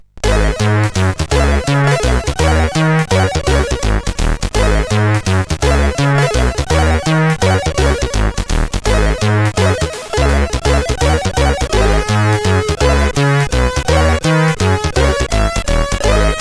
Lowland Jazz, sid CSG8580R5; hvsc

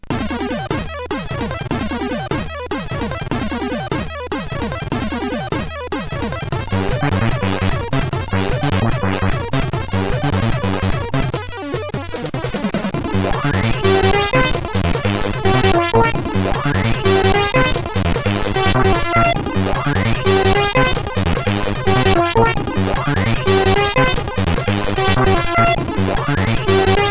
Trance, sid; CSG8580R5; hvsc

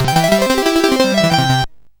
Fire Breath, CSG8580R5

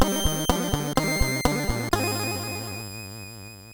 sid, CSG8580R5; Atic Atac C64

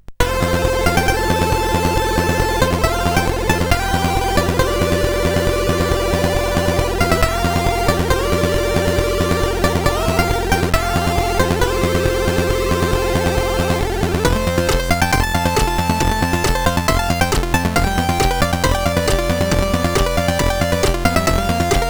sid CSG8580; unreal superhero 2

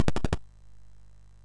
MOS6581R2, GAMES; Sammy Lightfoot

